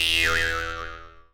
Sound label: boyoyon